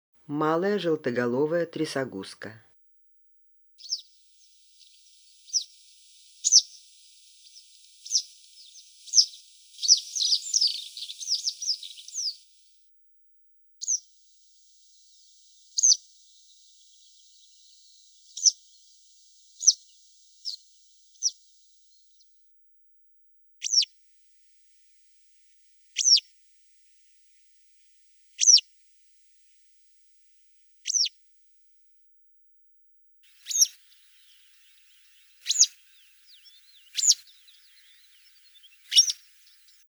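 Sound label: citrine wagtail voice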